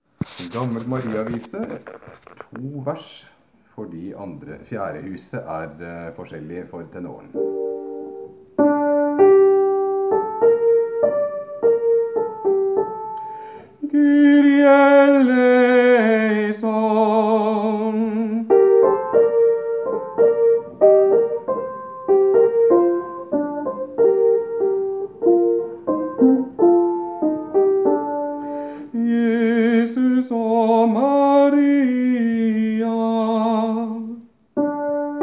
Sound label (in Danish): Gammel Mariavise - tenor